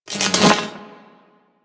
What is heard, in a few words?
Projectile Whizz By; Enemies; Abilities; Sentient Brachiolyst